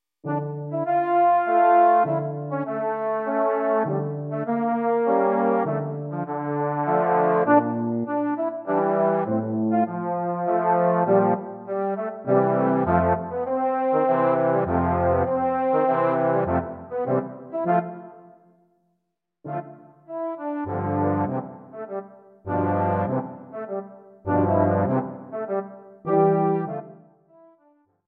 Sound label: Mazurka